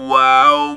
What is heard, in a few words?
Talk Box "wow"